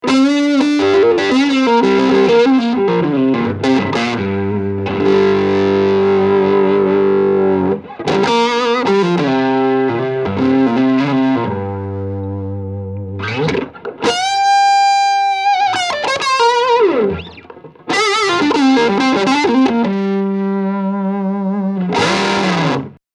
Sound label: fender ramparte